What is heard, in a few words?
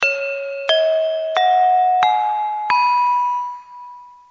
saron sl, gamelan